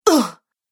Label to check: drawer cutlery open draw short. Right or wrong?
Wrong